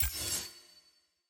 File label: ui button zoom click; tft